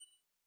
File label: Soft (Loud); UltimateCleanGUIPack